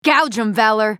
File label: spell cast effort; Quinn